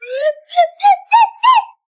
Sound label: slide whistle upwards notes